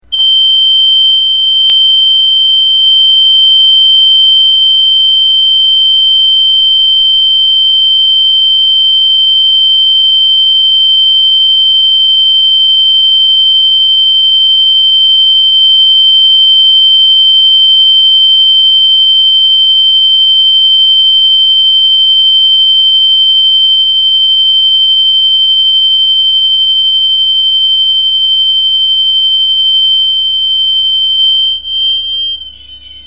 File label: gentex gx 90s 4, alarm